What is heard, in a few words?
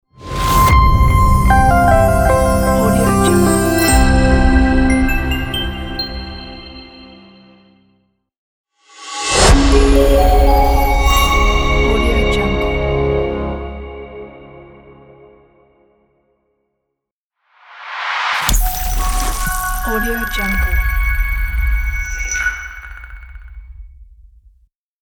modern logo pack